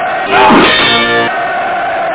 battle

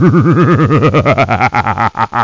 xeen laff

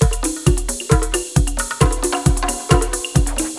Drum Loop

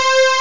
inst synt

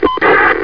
radio switch; SpecOps2 MPlayer Demo

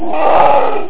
Monster Roar; Backbon2

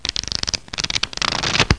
HAND

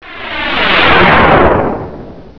Rockt Fly

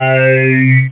SPRINGY; CDTV Lemmings

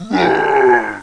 ax goblin hit; Ferazel's Wand Demo; Roar!

